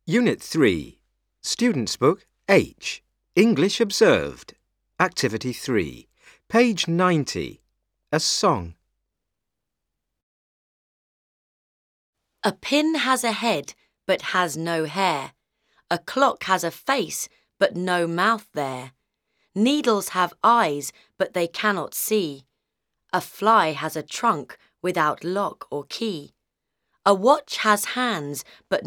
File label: a song